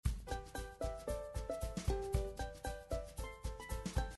beginner content happy music background

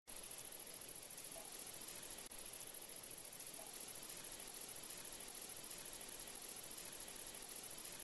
forest ambience; seven sec

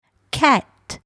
cat

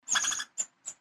squirrel chatter slow

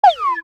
cartoon slide whistle ascend

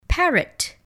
parrot

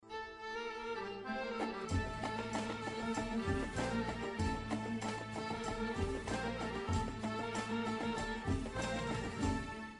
malaysia bgm

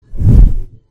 poof sound effect

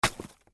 chopping

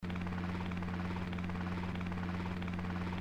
helicopter loop

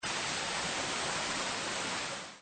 rain falling sound effect

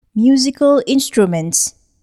musical instruments

